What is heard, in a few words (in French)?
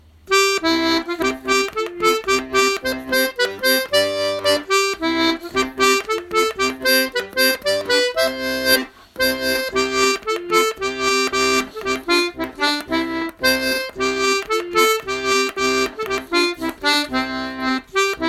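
polka des marins, accordéon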